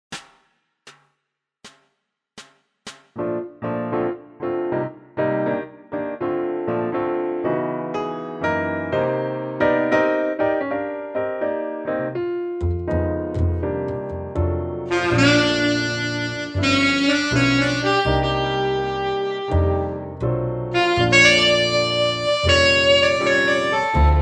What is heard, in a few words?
minus drums